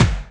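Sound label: Door kick, Boss DR660; drum machine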